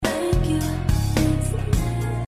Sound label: audio alert